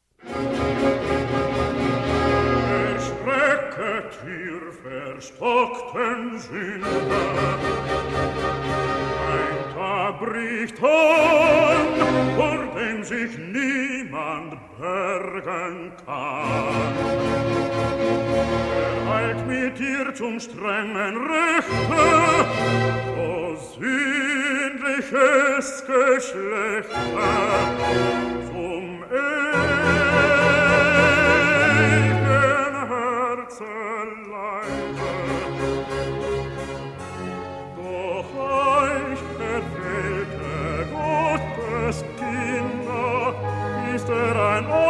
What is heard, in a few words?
Cantata BWV 70 Recitative, bass